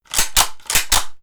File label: gun cocking; mechanical